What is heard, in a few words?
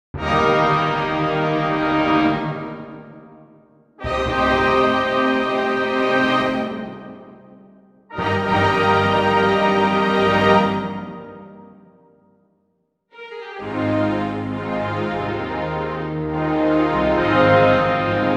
full orchestral accompaniment; 01 Overture, The Magic Flute (Die Zauberflöte), Full Orch accompaniment